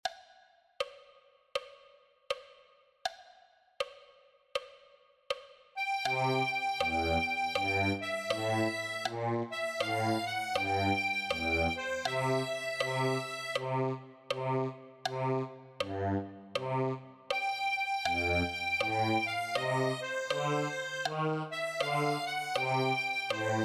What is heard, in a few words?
akkordeon; ladies blues songs